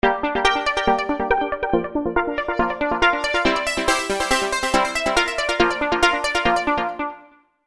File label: Retro Chords; V-Synth